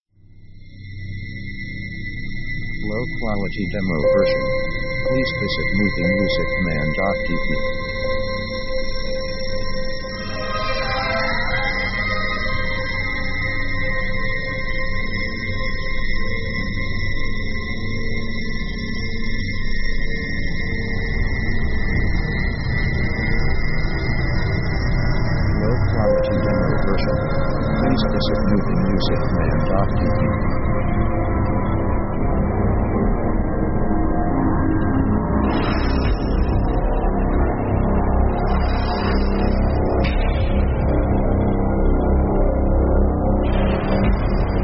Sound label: horror atmosphere; Dark mood